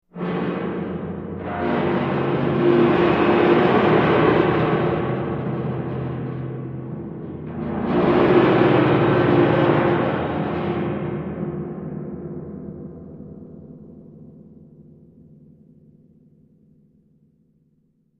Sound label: Dry Ice Eerie Slow, dry ice